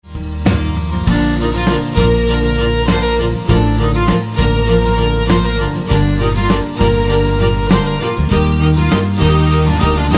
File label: orchestal solo